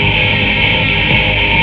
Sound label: FX GTR, GUITARS KIT